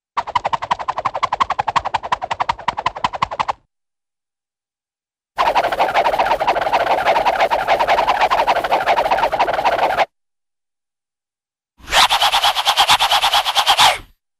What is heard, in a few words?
Rapid Swishes, Cartoon; Hollywood Edge; Cartoon Trax